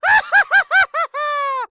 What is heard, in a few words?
Cartoon Human Male Evil Witch Laugh; AdobeCC